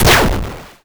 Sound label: hard, explosions